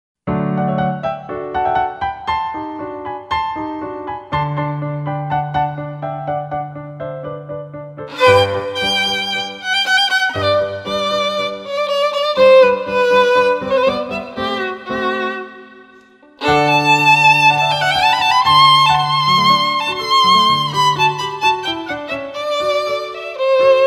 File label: classical violin